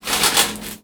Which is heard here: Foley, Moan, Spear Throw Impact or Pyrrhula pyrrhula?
Foley